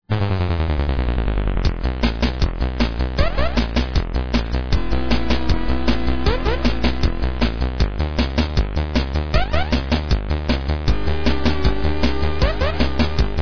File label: song; sven